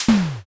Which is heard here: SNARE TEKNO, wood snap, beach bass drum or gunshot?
wood snap